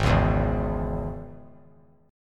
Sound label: Ab Suspended 4th Sharp 5th chord; 8,8,7,6; 3C,3F,3A,3D; strummed; JGuitar